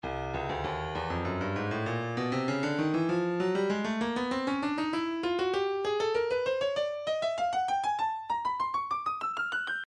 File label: breakbeat 098 bpm; two tone b; Stylus Breakbeat Midi Files